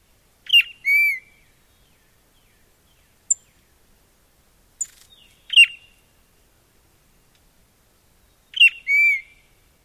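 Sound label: keep-her song and tsip calls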